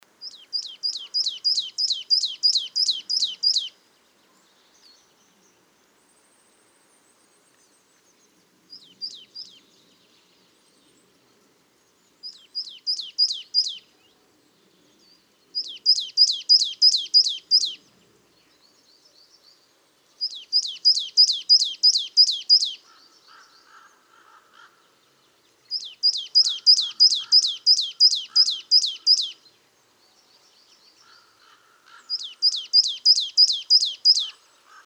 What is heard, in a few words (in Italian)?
Cincia mora